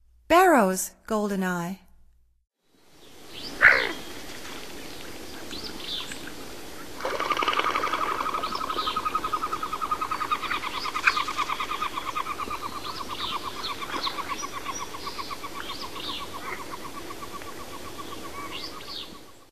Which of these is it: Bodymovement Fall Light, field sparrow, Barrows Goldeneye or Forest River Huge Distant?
Barrows Goldeneye